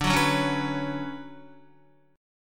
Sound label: D Major 13th D down Guitar 4F 4A 5C 5G 5C 6E; strummed; JGuitar